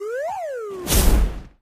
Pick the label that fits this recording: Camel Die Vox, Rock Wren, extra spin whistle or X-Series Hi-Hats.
extra spin whistle